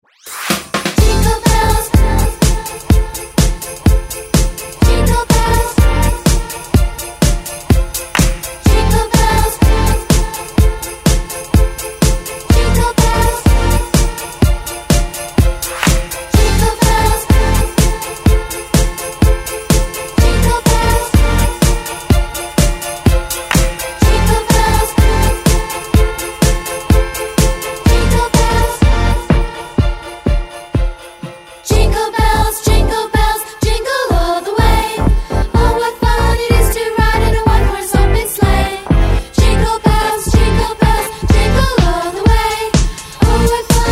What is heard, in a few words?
Syntheticsax, Jingle Bells, Choir